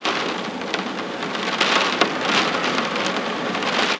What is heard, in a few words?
rain